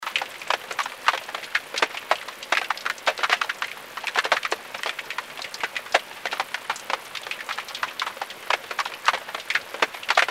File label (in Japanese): 雹